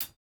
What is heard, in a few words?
hihat, house